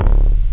ball; Bass

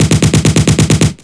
hm chgun

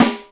snare

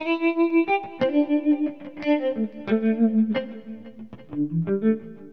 HipHop, Guitar; LiveAct Pool 1

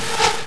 arrow miss; Odyssey

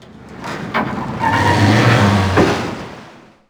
rubs

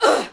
fem hit; DAoC; Toa; Gamestar

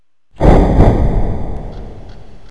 hit door; Hellboy

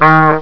Horn Bulb; Instrmnt; Sound Library 2000; Wayzata Technology

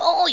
oooww; worms dc; tykes

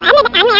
tape rewind

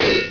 Blood Menu Popup

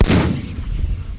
TNK FIRE; sw demo

